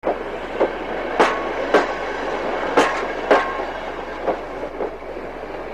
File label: loop